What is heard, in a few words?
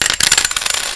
money